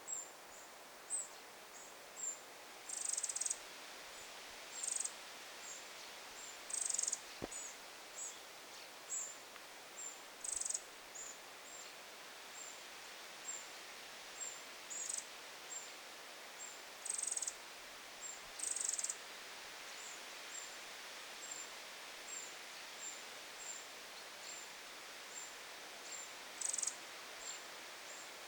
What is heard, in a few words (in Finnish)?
nuorten peukaloisten huomioaantelya